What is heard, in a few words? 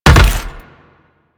Land Heavy Metal, Footsteps; Enemies; Warframe; Teshin; DE